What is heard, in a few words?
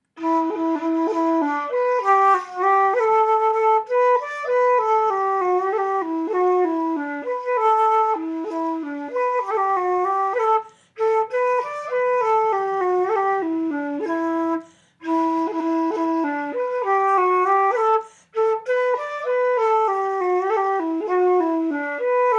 The Orphan flute